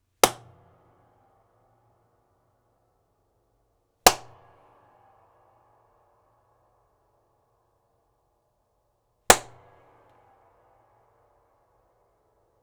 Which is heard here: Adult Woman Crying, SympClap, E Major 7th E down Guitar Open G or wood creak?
SympClap